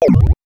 brute force; sound effects

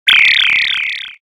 alien technology; sound effects

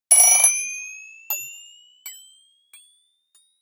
up to speed, ringtones; standard ringtones

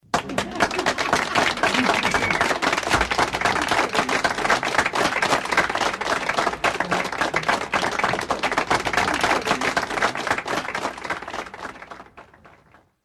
applause; wake-up-tones

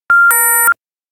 not good, harsh notification; application user interface ui sounds

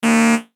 no trespassing, notification sounds

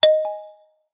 swift gesture; notification sounds; notification tone

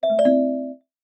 maybe, message tones

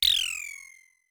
in passing

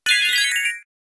crystalline, message tones

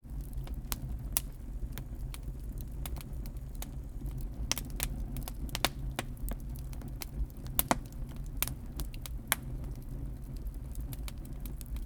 fire burning crackles; soft subtle ringtones

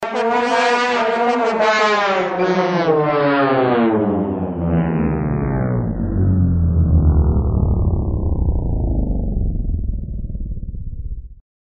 vuvuzela power down; funny

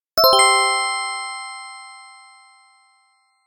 you would be glad

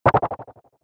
abduction, message tones

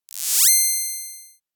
razor sharp, ringtones; standard ringtones

